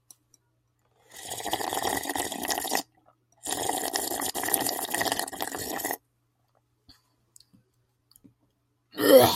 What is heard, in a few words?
schlorp schlorp